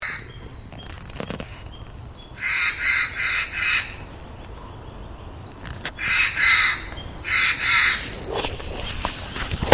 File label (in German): eichelhäher; Vögel